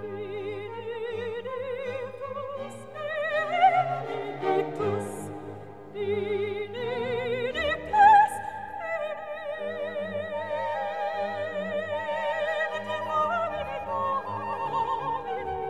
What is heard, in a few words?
Benedictus Soprano solo; LMozart